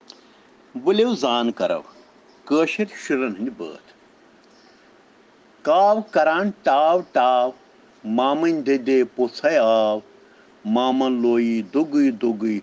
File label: Children Rhyms in Kashmiri